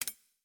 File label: progress button click